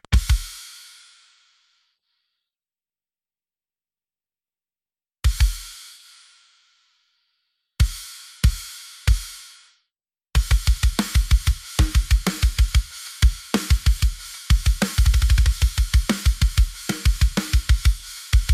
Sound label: Drums